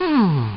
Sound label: HUMM; BattleSNDS